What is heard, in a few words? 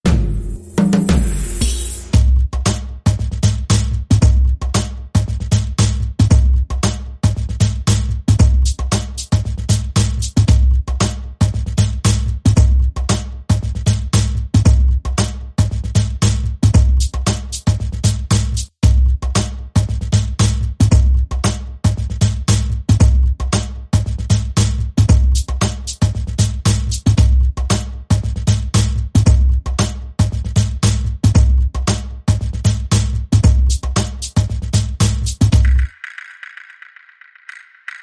Beat